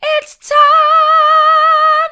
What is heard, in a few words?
it's time mid, vocal